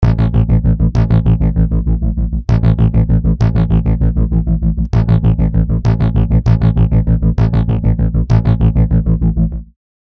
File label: Bass Rhythmic Pedal Blends; Scoring Bass